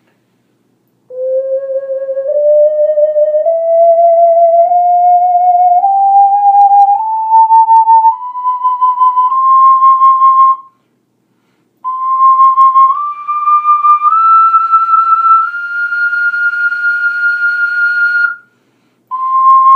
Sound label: Ocarina; Osawa